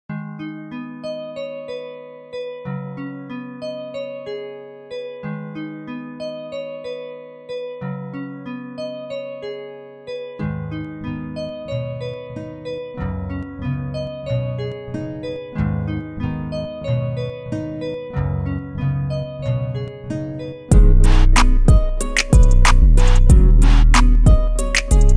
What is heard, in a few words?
type beat; Prod. by Dices